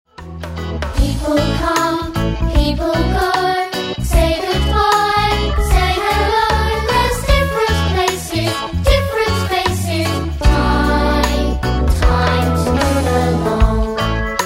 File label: Move Along Song